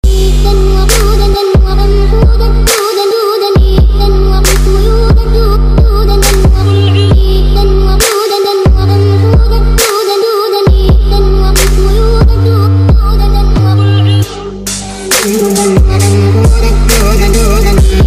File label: Trap; Invader